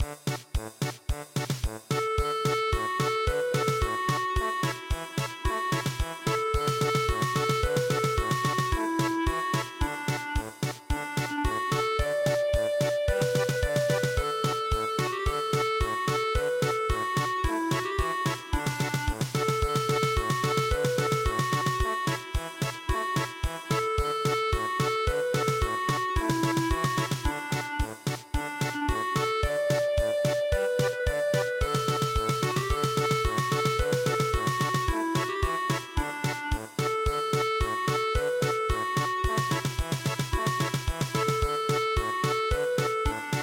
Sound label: polka; camptown races